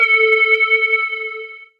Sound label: lead syn, Techno Trance; Ueberschall; Techno Trance Essentials; SYNTH